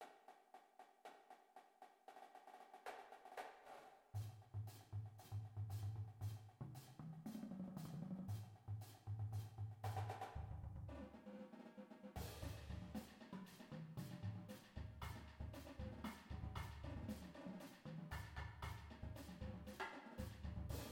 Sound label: cadence full drumline